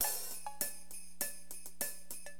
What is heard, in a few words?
DM LOOP, Drums, AcidJazz Funk; LiveAct Pool 1